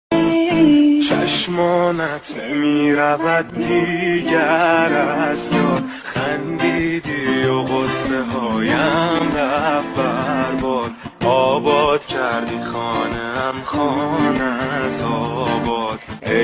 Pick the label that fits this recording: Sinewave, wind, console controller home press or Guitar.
Guitar